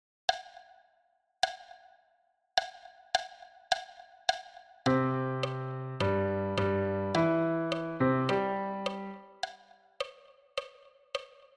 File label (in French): timbales piano a 105 bpm